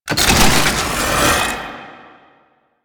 Archgun Charge Up; Warframe; Archwing

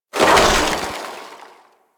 Worker Ice Lever Push; Warframe; Venus Open World; Venus Solaris United

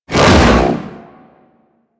Hoverboard Ground Spin; Warframe; Vehicles; Venus; anim sounds

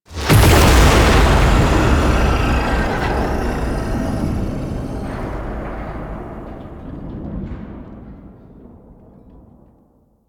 Alchemy Fully Mixed Stinger; Warframe; Entrati

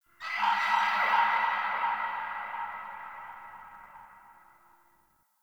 Ext Creature Distant Mid; Ambience; Misc Outdoor; Warframe; Infested Eris

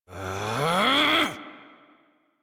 Exert Adult, Melee; Warframe; Duviri; Drifter; en

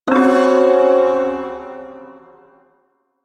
Ersatz Shawzin Hirajoshi Chord; Warframe; Duviri Ersatz Shawzin

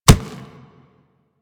Kubrow Step Dirt, Footsteps; Enemies; Warframe

